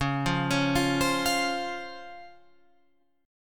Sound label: C sharp Major 7th C sharp Guitar Open F; C#M7; arpeggiated